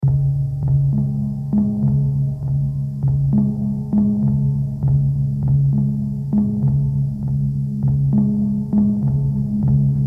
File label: Minimalist Ambient